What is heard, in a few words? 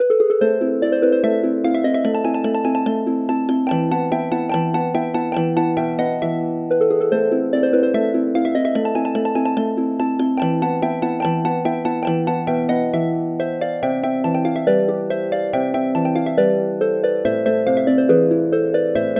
harp